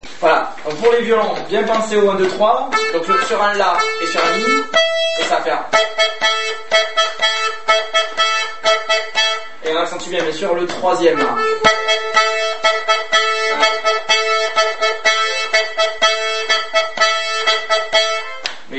Bourree Marrakech (rythmique violons); Musique d-ensemble

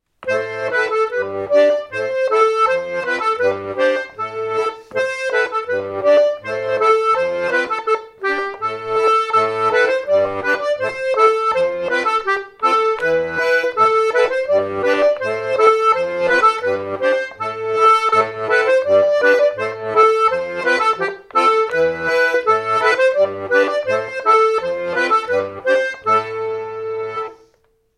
Hanter dro; rencontres-2011

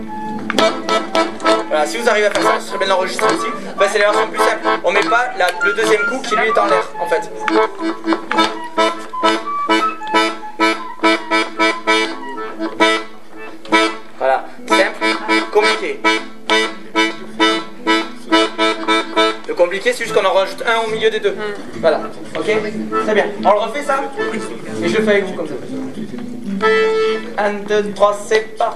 rythmique diatos simple

